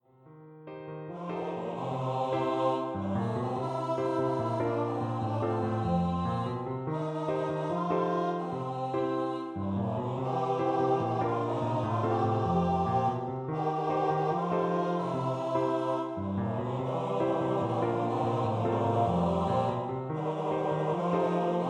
3 part round for Male Voice Choir

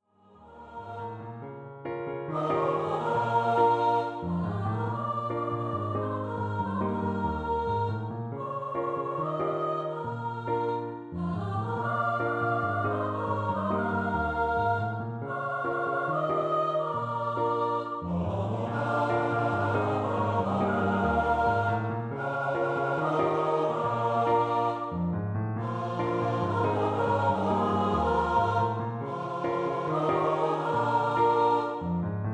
Unison 3 part round for Mixed Voice Choir

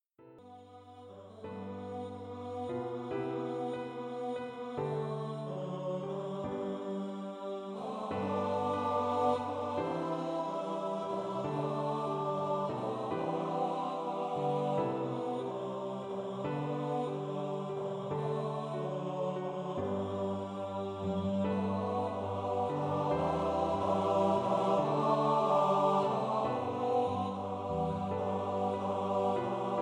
4 part song for Male Voice Choir

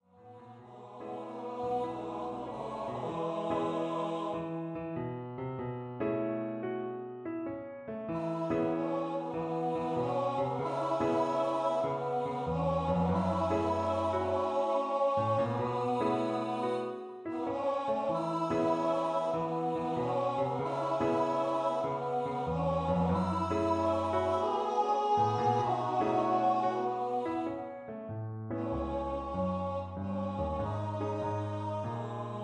Vocal duet for tenor voices

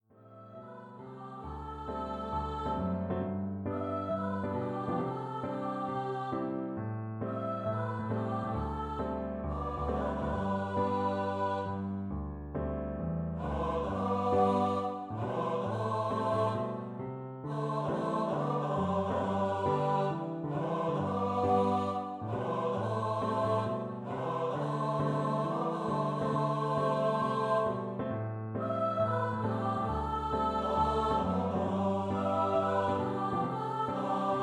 vocal duet for treble tenor voice

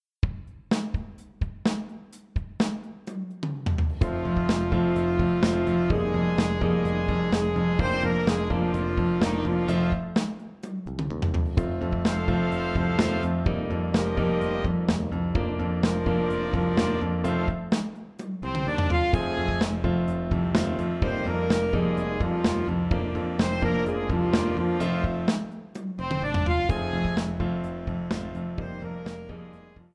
for Jazz Ensemble

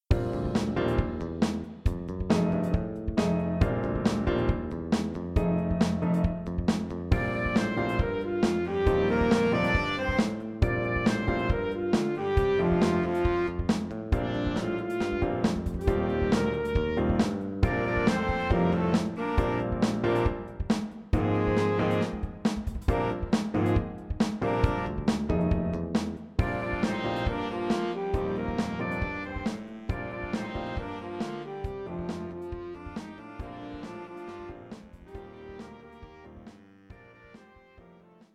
for Jazz Ensemble

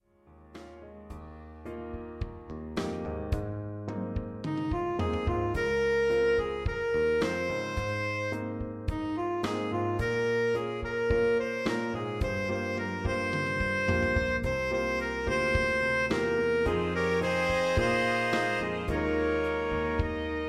BIG BAND

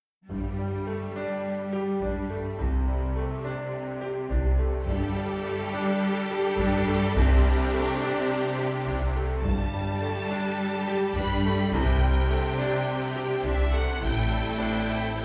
ODE TO SIBELIUS for String Orchestra and piano

